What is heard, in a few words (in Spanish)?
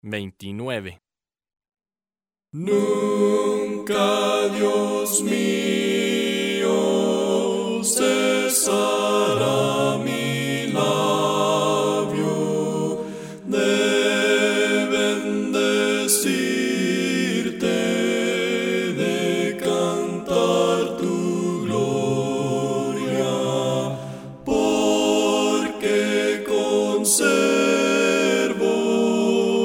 Palabras cantadas sin instrumentos; Nunca Dios mio